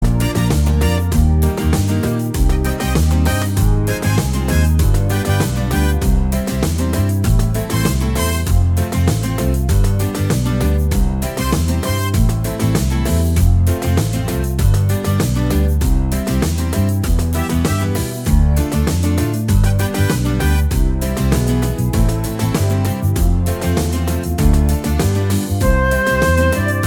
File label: cumbia